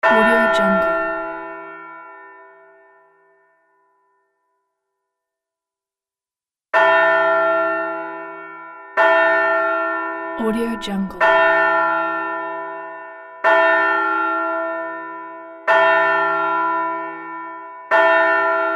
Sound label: church bells; garnavutka-nonexclusive